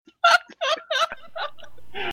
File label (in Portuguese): risada